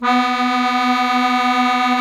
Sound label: Musete, Accordians; Club-50 - Foundations